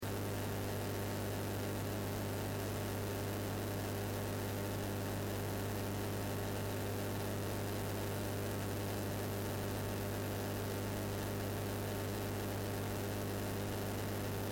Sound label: noise